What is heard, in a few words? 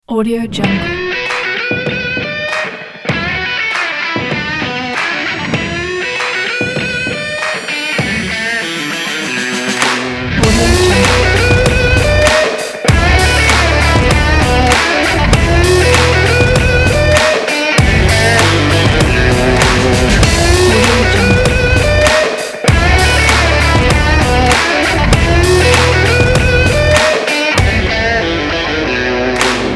sport game big beat trailer guitar